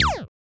Zapp, techno drum fx

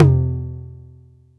Jomox Tom; techno drum fx; Analogue DrumHits

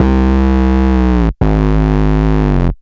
Bass B dist 170 C, drum n bass 170bpm; musicradar; Basslines B

